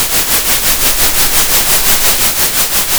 center, Sound of a train

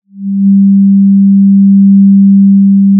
pop, Sound of a cruise ship horn

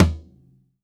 Tom, drum

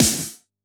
Elec Snr, drum

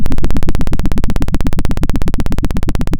pop, Sound of a helicopter

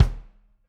room Kick, drum

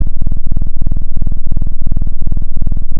pop, Sound of a cat purring

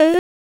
achievement strong; VIVAE

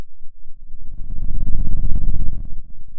Sound of a dinosaur

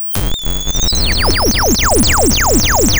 pop

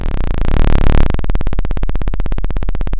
Sound of a chainsaw

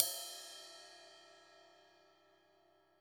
Trash, drum

